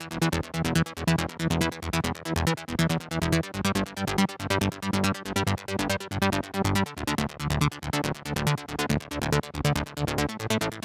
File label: Bassline Middle 140BPM, E minor; Vengeance Samples